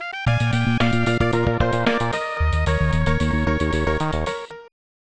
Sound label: Player Down; DK Country 2